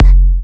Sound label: Moombah Kick; Moombah Kit; Hip Hop; STYLE KITS (Hip Hop, Etc)